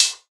low treble hihat e key, pedal hi-hat single hit tuned to the E note